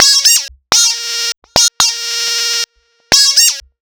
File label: Twocked Lead, Underground UK House & Electro, Synths; Sonic Foundry (Sony Creative Software)